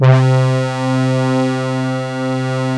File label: ARP, Analog Fantasies